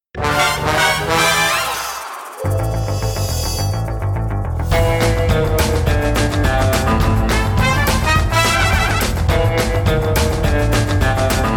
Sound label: theme instrumental; pbskids; go studio